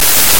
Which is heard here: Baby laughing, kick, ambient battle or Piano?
Baby laughing